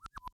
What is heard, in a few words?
Fish